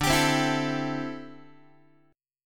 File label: D 7th Suspended 2nd Suspended 4th D, Guitar; D7sus2sus4 strummed